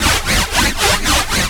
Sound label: scifi, SCIFI & SWEEPS; Techno Trance Essentials